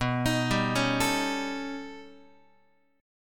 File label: B Augmented 9th B Guitar, chord; arpeggiated; JGuitar